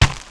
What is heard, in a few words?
hit path; Mafie 1